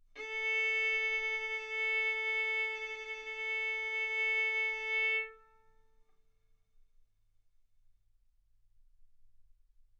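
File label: Violin arco mf A string A4 B4 stereo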